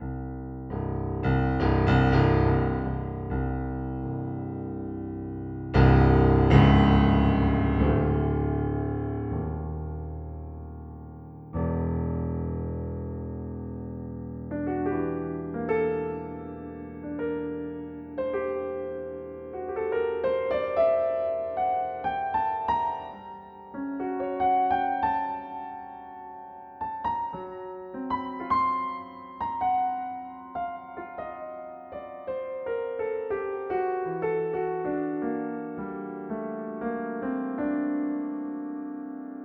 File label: piano; virtual piano comparison